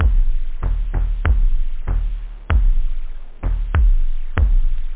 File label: tom, loop house; da capo vol1; instruments